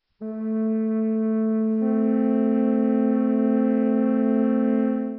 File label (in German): flöte a1 c2; Intervalle, die man durch Potenzen von 2 und 3 darstellen kann